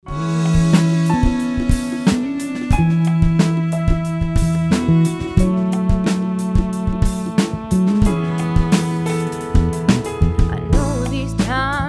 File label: Pop Rock